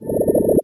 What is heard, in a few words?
Blubbering Drone